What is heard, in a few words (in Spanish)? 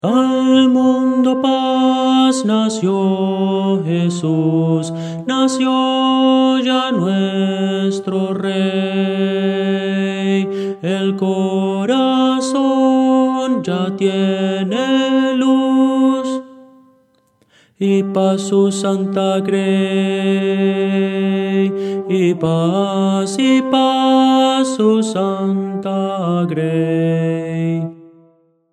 tenor